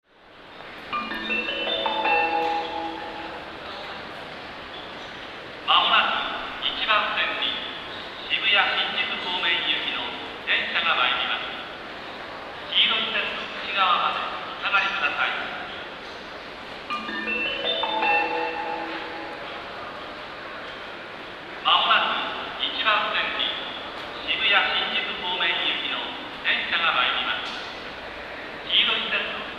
Tokyo Train Tunes; japan; ebisu

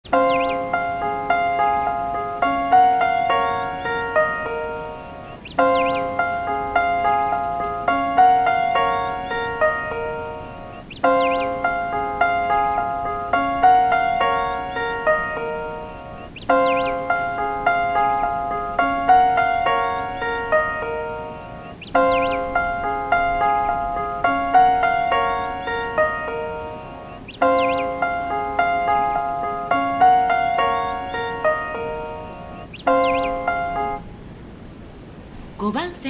shinjuku melody, Tokyo Train Tunes; japan

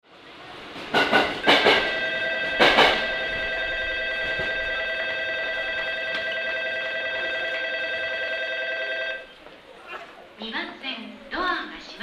shinokubo bell, Tokyo Train Tunes; japan

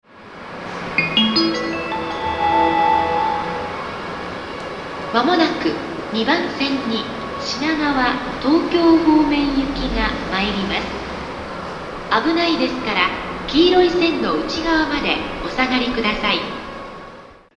ebisu, Tokyo Train Tunes; japan

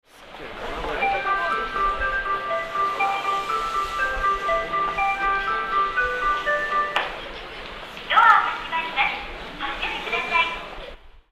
otsuka melody, Tokyo Train Tunes; japan

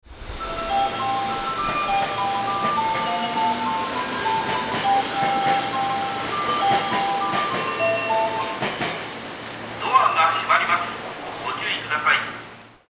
yoyogi melody, Tokyo Train Tunes; japan

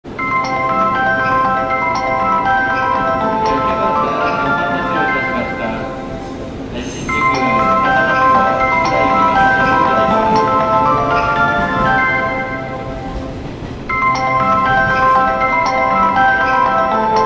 shinjuku melody, Tokyo Train Tunes; japan

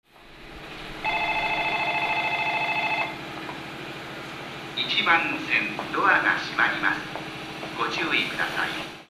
shinokubo bell, Tokyo Train Tunes; japan

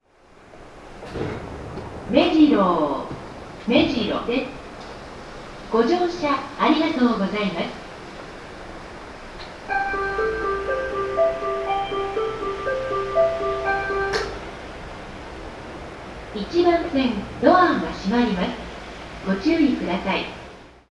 mejiro melody, Tokyo Train Tunes; japan

